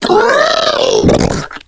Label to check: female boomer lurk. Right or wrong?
Right